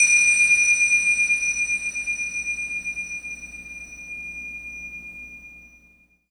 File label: Handbell, Percussion